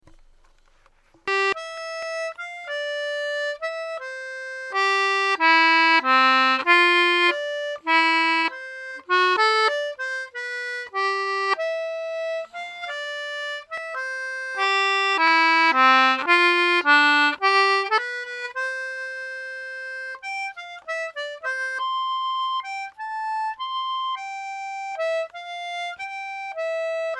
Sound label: meantone